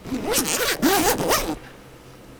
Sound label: zipper